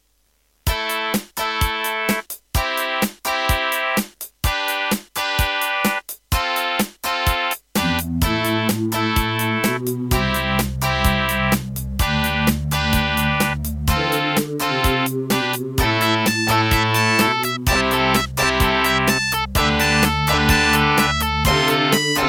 Hizon DB333; raptor call of the shadows

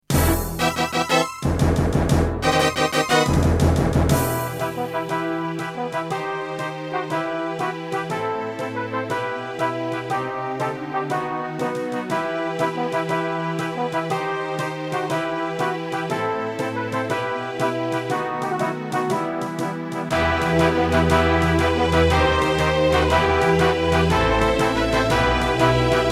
Terratec MiniWaveSystem TWTT; Warcraft II

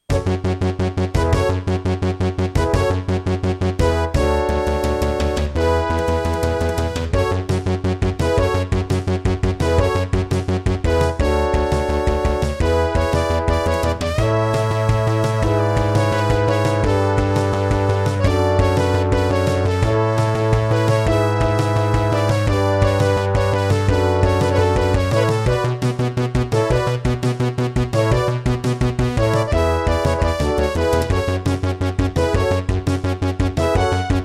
Intro, Yamaha MU2000 MT32 Mode; 4D Sports Boxing

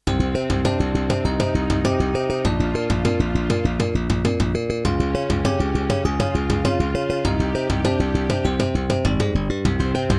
Korg Audio Gallery AG-10; Hocus Pocus

